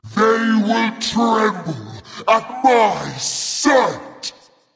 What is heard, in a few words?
transformation; smite; voicepack; thanatos